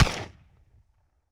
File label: Benelli 12 Gauge Shotgun Blast; Weapons & Firearms; Sony Pictures Sound Effects Series Volumes 1-10; Sony Creative Software